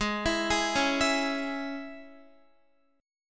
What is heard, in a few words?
G sharp Suspended 4th Sharp 5th Guitar chord, down; arpeggiated; 4E,4A,5D,5G,5C,6F; G#sus4#5